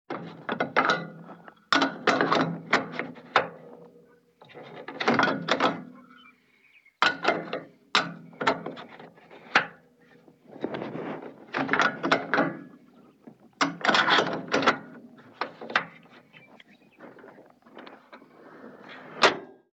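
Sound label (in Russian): fuel tank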